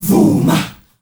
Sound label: VOOH MAHH L, GRP CHANT; Spectrasonics; Heart of Africa 1